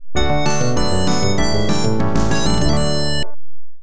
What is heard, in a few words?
Jingle, pinball; Santa Jingle; Taxi Williams pinball